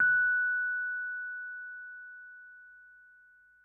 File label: F#6, Rhodes Mark II; mkII 88 key LO; CEPCo Sample Series Vol. 3